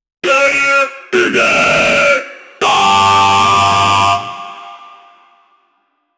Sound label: hardcore dubstep music